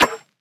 Percussion, Hip Hop, Drum One Shots

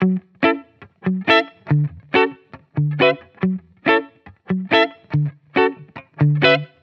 Guitar Chord Loop 140 BPM F# Min; Future Bass; Instruments; Guitar Loops

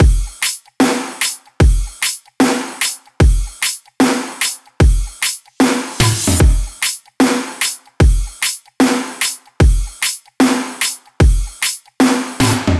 Future Bass Full Drum Loop 150 BPM

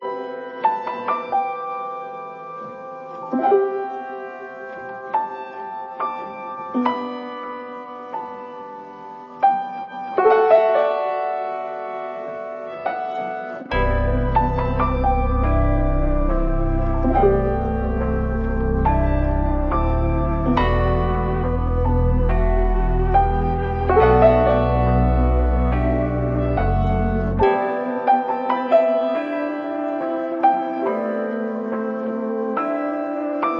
140 BPM E Min, Vintage & RnB Melodies; Sad Story

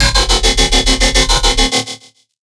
Riddim Bass Shot (140); Dubstep; Riddim Basslines; Virus; Ghosthack; Advent Calendar 2019 Day 17; Riddim Bass Shots

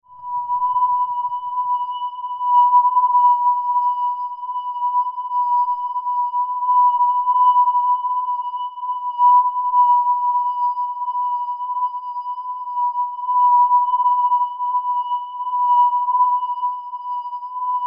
Tone

